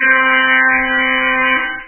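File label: horn sound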